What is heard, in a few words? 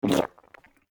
come Flea